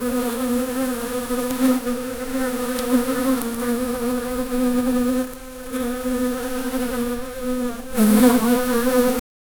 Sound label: buzzing bee